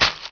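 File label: WALK, footsteps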